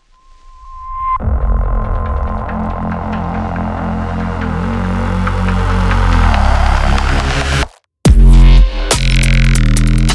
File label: rumble, Rumble Bass Synth; testbericht-rumble-bass-synth-von-uvi